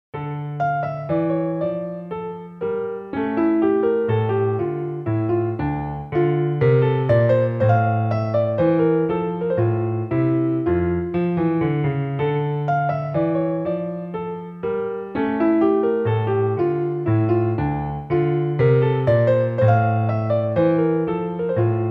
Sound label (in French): Menuet en ré mineur, piano; Bach JS